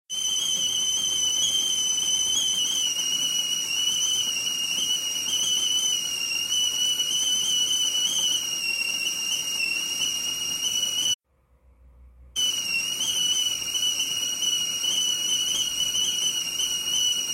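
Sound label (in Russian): чайник свистит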